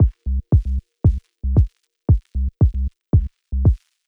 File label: Neat Rhythm, Loops; BB 2HTC Samples Vol 4 Addendum